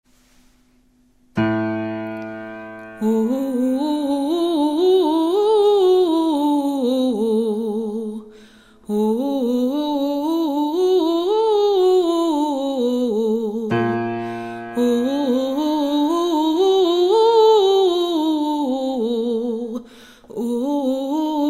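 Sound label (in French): saut de tierce alto baryton; Exercices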